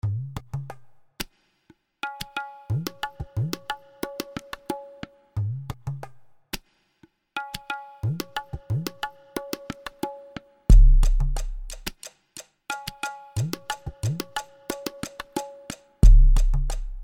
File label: Test Beat